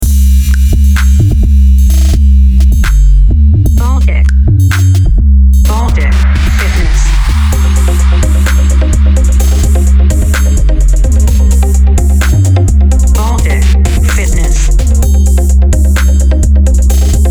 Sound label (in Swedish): reklamjingel; Baltic Fitness